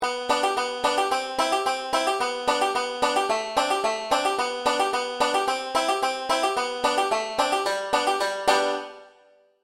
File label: basic melody, banjo; 5 string; boil cabbage